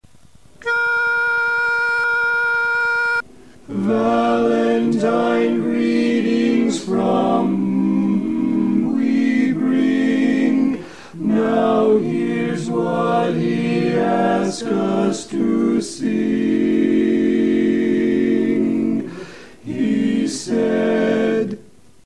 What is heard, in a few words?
BARI Bb Greetings, barbershop quartet